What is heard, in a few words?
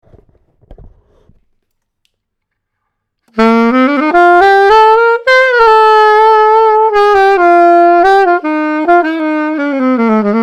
alto; Lupifaro